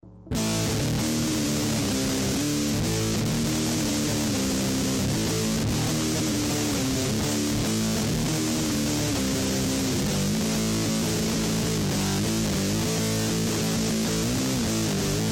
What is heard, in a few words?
3 Piece Hard Rock Opera